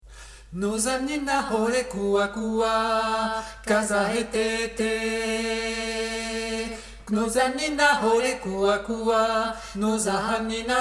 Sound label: Tutti; Nozanina